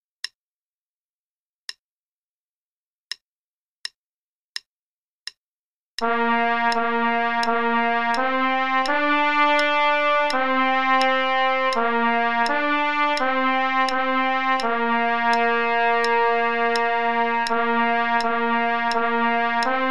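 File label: Play-a-long, quarter note = 84, trumpet; SOE Bk 1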